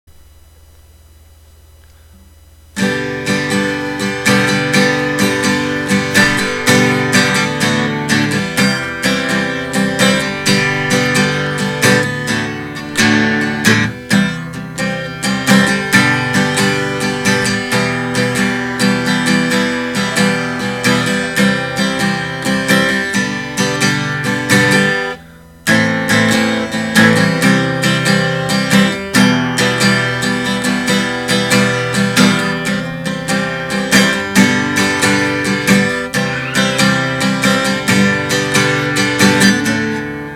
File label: chords